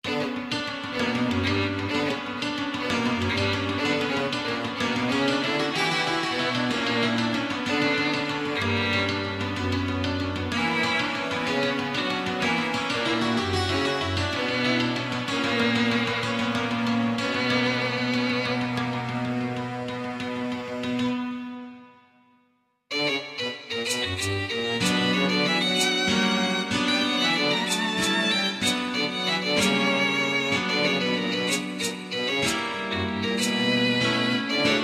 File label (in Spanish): DANZA PONIENTE; FTR Merida; Medea; TEATRO